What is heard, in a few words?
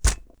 knock